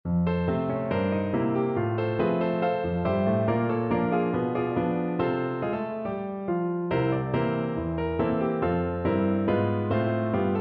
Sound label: ragtime, piano